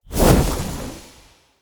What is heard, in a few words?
Casting Device Cast Right; Galene